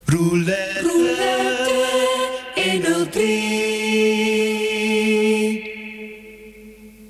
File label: silence